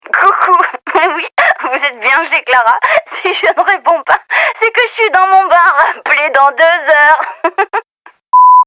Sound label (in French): Bruit